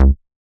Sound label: Squarehead Bass C#